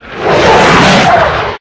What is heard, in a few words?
damage hits explosions; World Of Warships